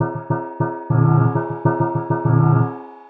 nosnare

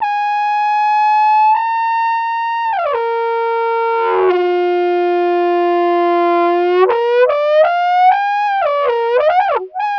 brass random

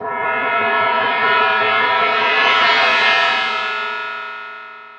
shell roll

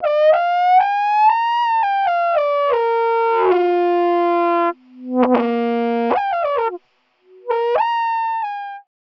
brass random

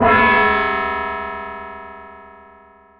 shell flat nonlinear

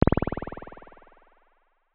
Spring sim

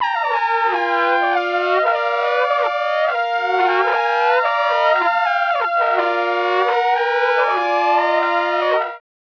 brass random combo

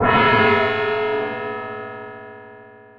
shell curved nonlinear

